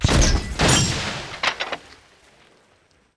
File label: grenade launcher; daniel simon